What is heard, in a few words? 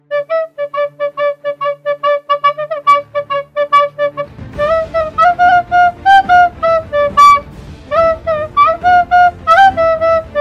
rave flute